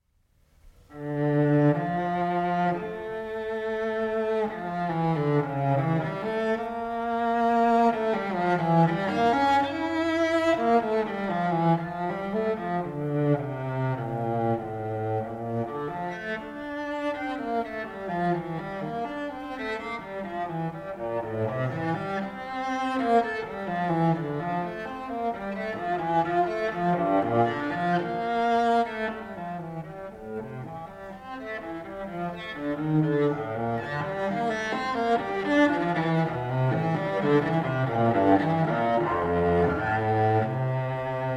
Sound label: Cello Suite No. 2 in D Minor, Prélude; Bach, JS; BWV 1008 I.